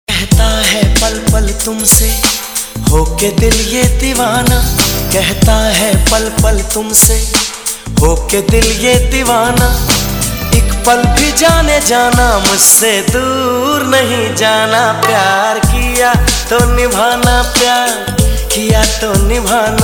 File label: Mobile tone